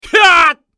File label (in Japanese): 男 攻撃長; マスケッティア